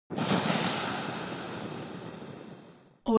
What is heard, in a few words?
atmo volcano volcano oneshot lava sizzle